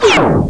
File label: zap; KidGrid 1.1 Demo